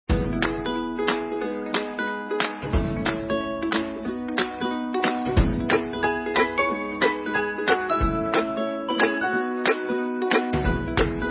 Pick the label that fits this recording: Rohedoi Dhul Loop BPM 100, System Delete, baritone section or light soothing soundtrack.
light soothing soundtrack